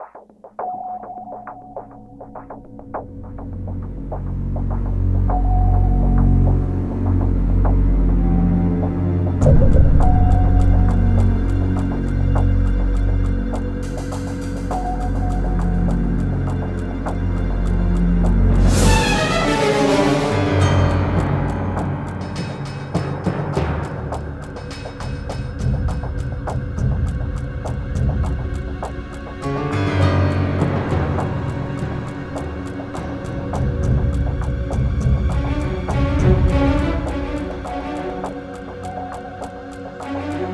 ambiance; lorelei salvage rig; Syphon Filter The Omega Strain; PS2

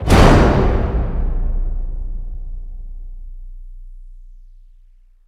Stinger Scary; N.O.V.A. 3 Near Orbit Vanguard Alliance

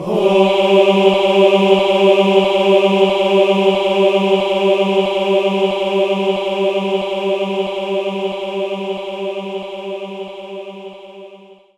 Concert Choir G3

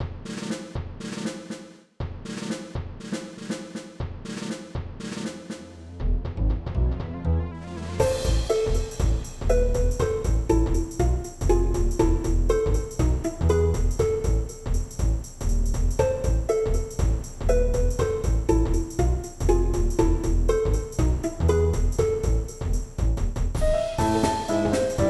Main Music; Plants vs. Zombies

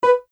Rhythm Whoops; super crazy rhythm castle

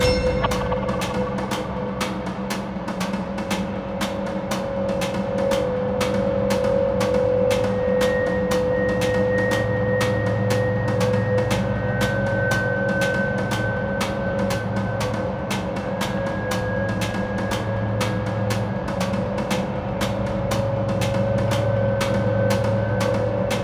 battle layer

